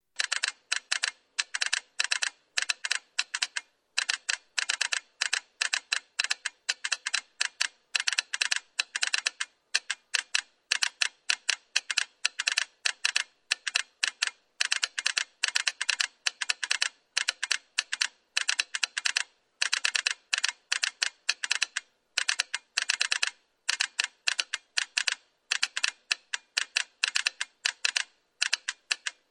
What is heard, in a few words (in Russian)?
Telegraf